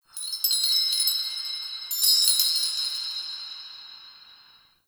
Metal Chimes; Warframe; Ambience; Nokko Colony

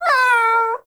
Kitten Meow Playful; Enemies; Warframe; Catbrow

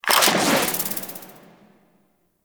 Summon Drone; Warframe; Veilbreaker; Venkra

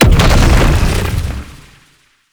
Beam End; Zenurik; Focus; Ultimate Abilities

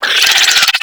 Infested Biped Attack; Warframe

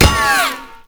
Shield Impact; Enemies; Gameplay; Lasrian Tank; Warframe

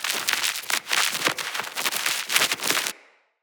Vassal Laser Loop; Enemy; Characters; Abilities; Mendicant; Mnd King

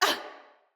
Pain Female; Battle; Envoy

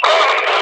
Enemy Pain; Warframe; Lasrian; Battle Barks

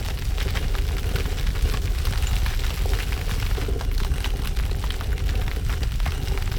Shrine Temple Door Loop

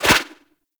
Turbulence Deflect; Warframe; Tengu

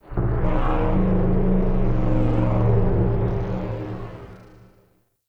Low Growl; Warframe; The New War; Zariman; Apartment; Scene Six

